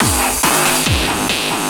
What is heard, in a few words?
Fills, Loops 140 BPM